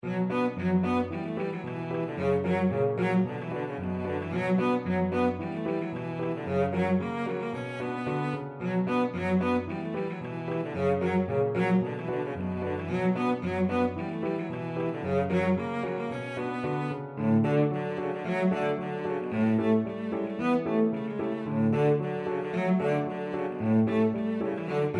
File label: polkka, cello; Knapsauspolkka